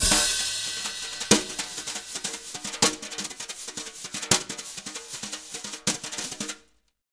Jazz Latin Drumloops; USB Soundscan vol.08; AKAI

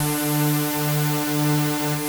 KORG D4; Hollywood Edge - Giorgio Moroder Rare Synthesizer Collection

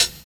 Hhat, Hihat; Equipped Music; Loungin House

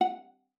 F#5, VIOLA PIZZ; Xsample VOL-5; 90 s Sample CDs

